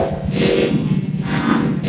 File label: GALACTIC